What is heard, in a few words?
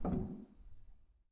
rel, Grand; bitKlavier; Binaural